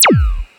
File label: Lazer FX